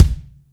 kick, ragga dub reggae

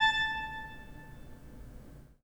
PIPE, 3DOrgans

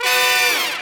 stab; Zero G; Man Machine

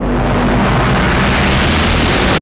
RIPSNORTIN, Trance Fusion, STOP SHORT; ILIO

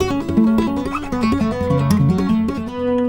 Slippery, Acoustic String; Sonic Foundry (Sony Creative Software); Friendly Fire

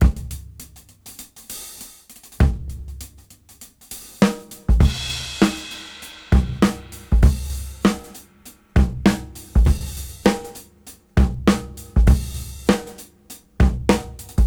Swing 99 BPM; AMG - Keith LeBlanc Phat Butt Jams

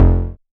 Bass, Synths, 5U Modular

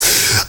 MALE SOLO, MALE NOISE, Heart of Africa

